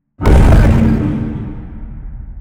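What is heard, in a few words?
pile distant, construction; ambient; pile samples; Half Life Alyx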